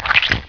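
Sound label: blood drip; mk2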